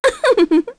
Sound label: Laugh, kr; voices; heroes; Shamilla; kingsraid